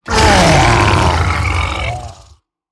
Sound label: Monster; Zenless Zone Zero